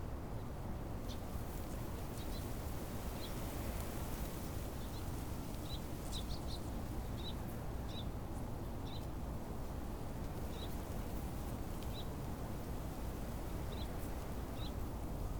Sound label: outdoor road birds